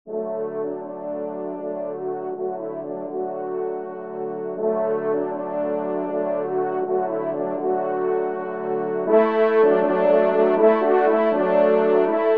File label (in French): ensemble, trompe cors; Trompes ou Cors en Ré; Echos Champetres; Viney